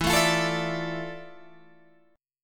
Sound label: F Major 13th E, guitar chord